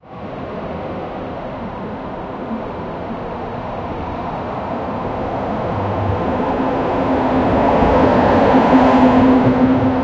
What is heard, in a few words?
the sounds of a passing, city